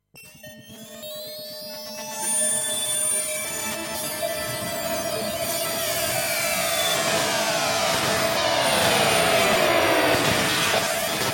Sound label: FM Landscapes